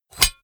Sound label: Control Select Up